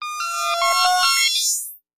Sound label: Random Tone Transition